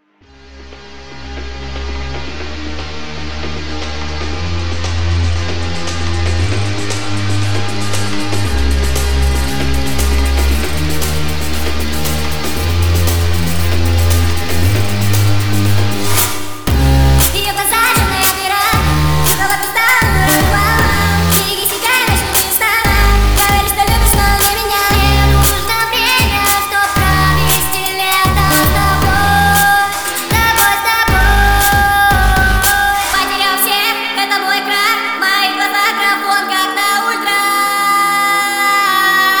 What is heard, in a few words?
FAST REVERB